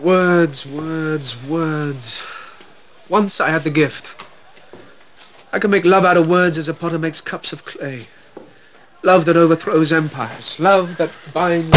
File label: shakes; Schuetzenchor